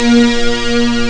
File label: Strings, Amiga Soundtracker; Amiga Soundtracker Sample Packs